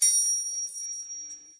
triangle

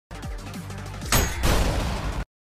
parryyyyy; ultrakill

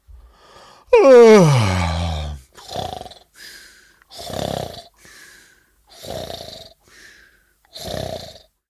falling asleep

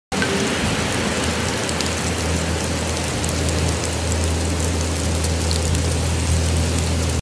snowing sounds only

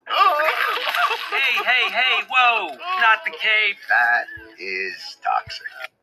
slime crying

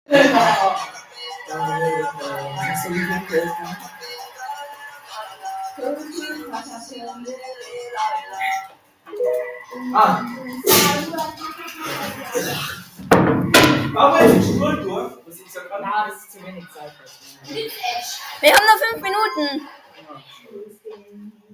bass; subwaysurfer

